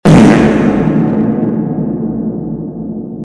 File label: Fart With Reverb